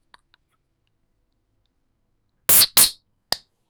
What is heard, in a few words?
sound of just opened can, beverages